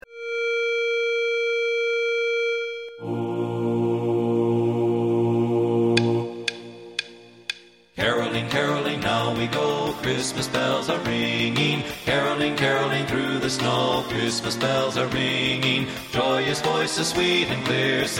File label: Bass; Christmas